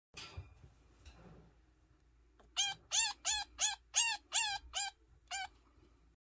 Long tailed chinchilla; چینچیلای دم دراز

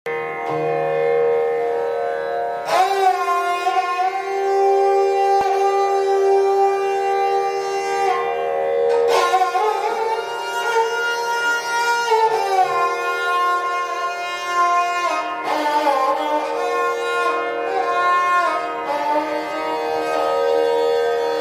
sarangi

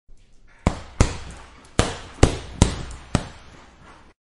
Punching punching bag; مشت زدن به کیسه بوکس

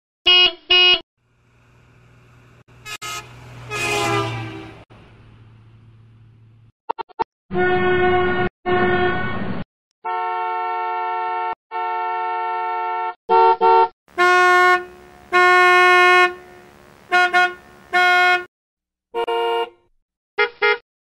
Vehicle Horn Sound Effects

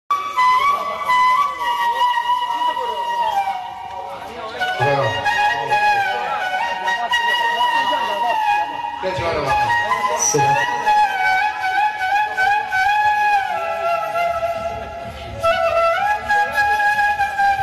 flute

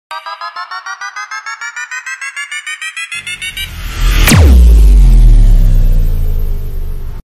Ray

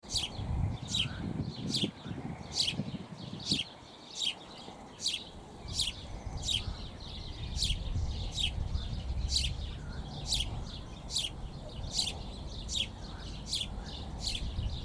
True sparrows

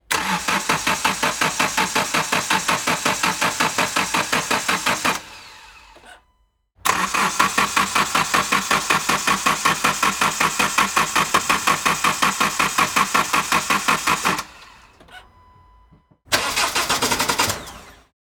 car does not turn on

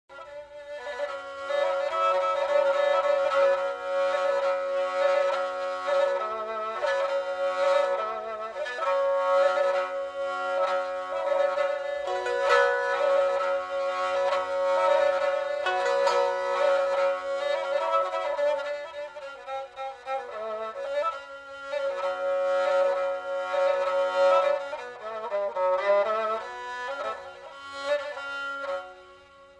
fiddle

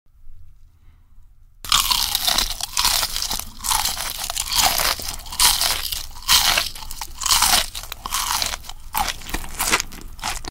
Eating fried onions

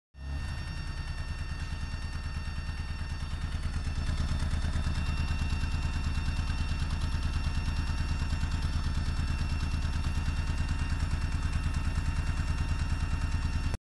daewoo matiz

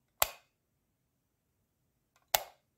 Lamp on off switch; کلید روشن خاموش لامپ

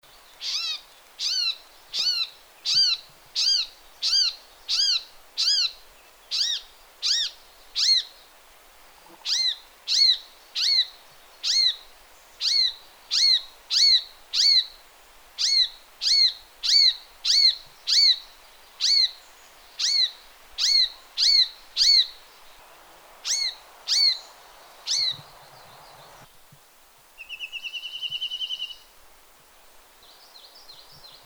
Chinese nuthatch; پرنده کمرکولی چینی

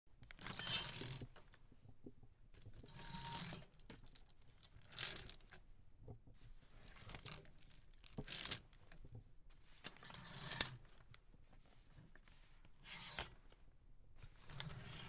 clothes hanger; چوب لباسی

